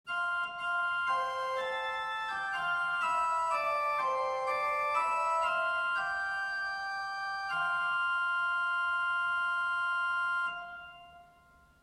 HW Mixtur 4fach

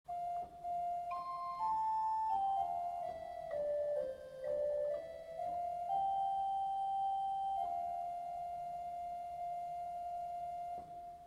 Floete 4

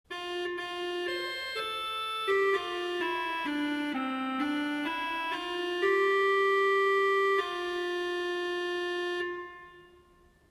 RP Cromhorn 8

